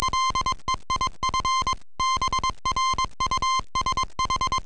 Morse Code

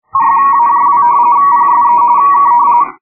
rtty

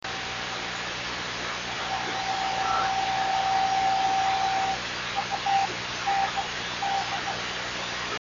driftnet beacons; 26.980

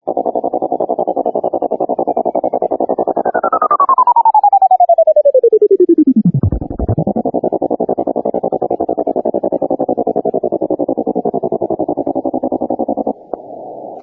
Yaesu click fix

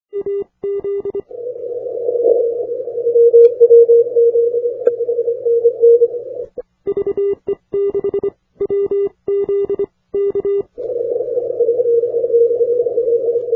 80m cw

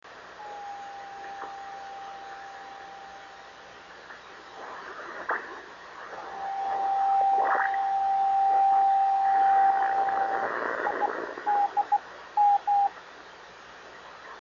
26910KHz

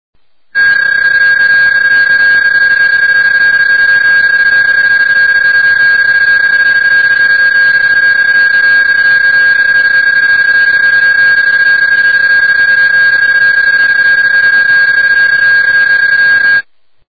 psk31

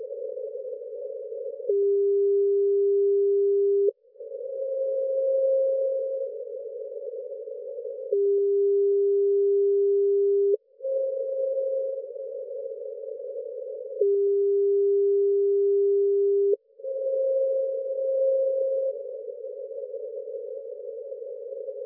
echo; echo 3m

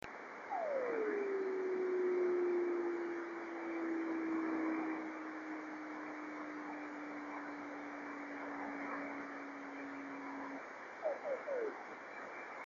28041KHz

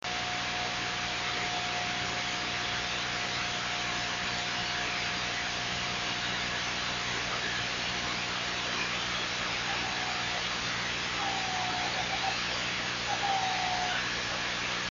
26510KHz

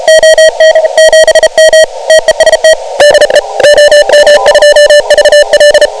28MHz

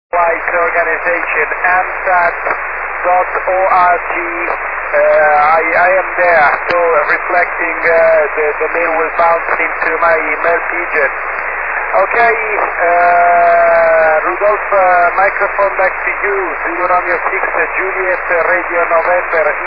6m tep

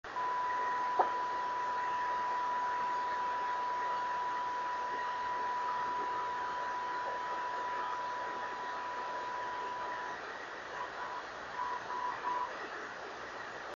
28060KHz

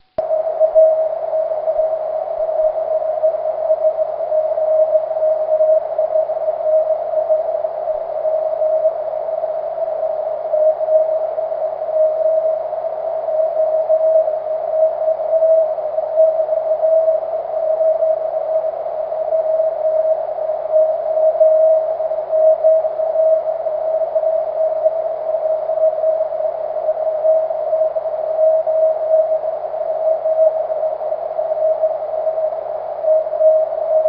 cw

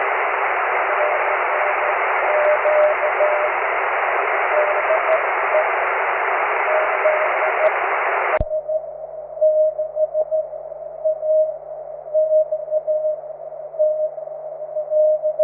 cw

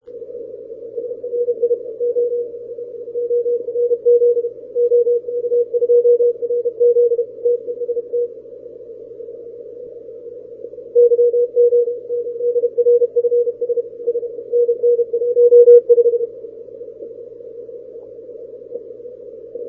80m cw; FT-1000d; CQWW CW; OK2RZ

